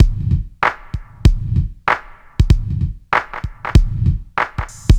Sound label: 96 bpm drum groove g key, Free breakbeat - kick tuned to the G note. Loudest frequency: 531Hz